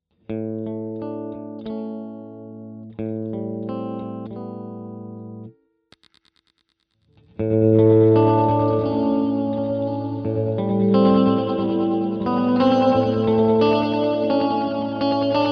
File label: LFO; SPACE BENDER x10